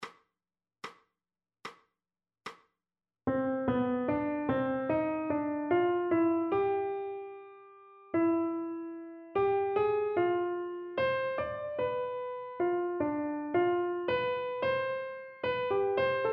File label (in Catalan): Audio Dictat melodic Jazz i Musica Moderna